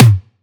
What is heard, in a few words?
Tom Low; Antidote Advent; Infected Track Drums